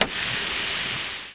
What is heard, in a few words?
open; vehicles; sligwolf